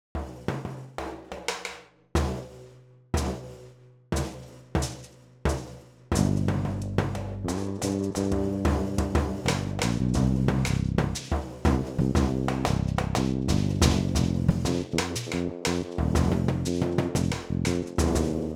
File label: Frame Accomp, marimba; remix accompaniments